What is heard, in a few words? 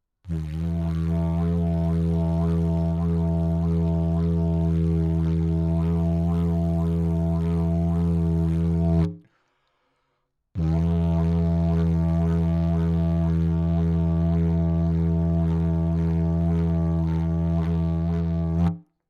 didgeridoo; PM500T test